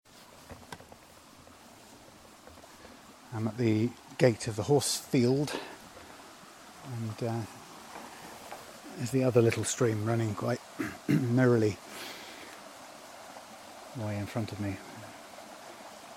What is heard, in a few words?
stream walk